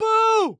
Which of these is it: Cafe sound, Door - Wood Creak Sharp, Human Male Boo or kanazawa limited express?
Human Male Boo